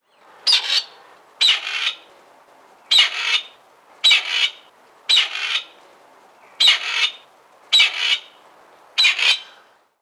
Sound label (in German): Rebhuhn